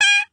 jump; cookie run

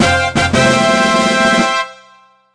Grand Champ Advance Fail; cookie run

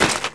Transform End; cookierunwiki

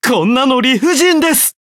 hit, ja; cookie run

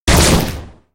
destroy

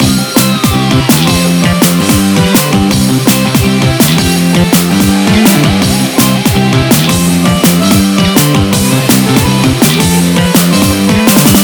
Bgm Bonus Night; rockstar; cookierun

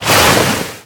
sea shooting magic candy; agar agar; Cookie Run

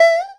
jump; Cookie Run

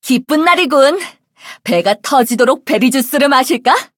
levelup; cookie run

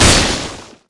Magic Candy Obstacle Crash; Cookie Run; Captain Ice

